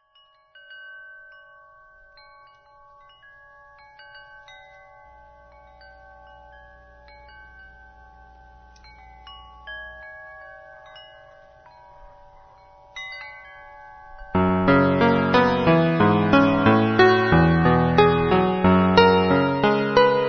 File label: chimes